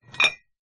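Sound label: Weight Bar Bell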